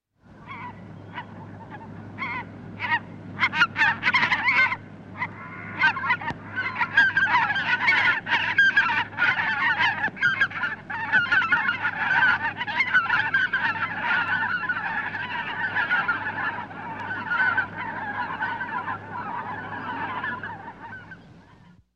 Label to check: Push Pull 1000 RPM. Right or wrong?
Wrong